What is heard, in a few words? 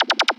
Thunky Lead